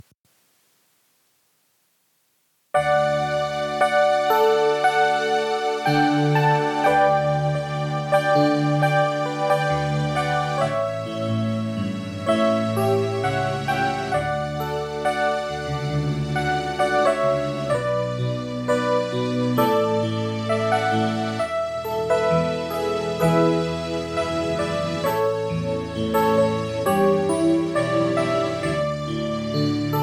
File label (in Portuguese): playback hino